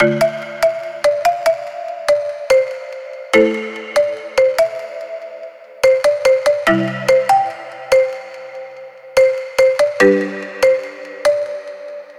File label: Catchy Melody Resolving 144BPM; nikos ultimate midi pack